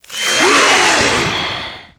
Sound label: Shadowbeast Death